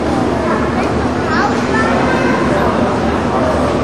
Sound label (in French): foule cour